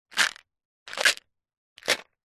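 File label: vitamin tablets in plastic pot lid on shake; household; zapsplat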